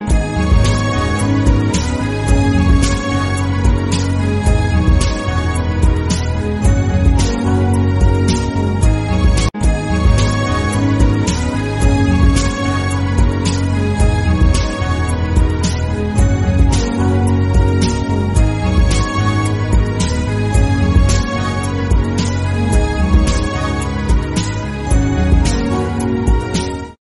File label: sad romantic love ringtone, bollywood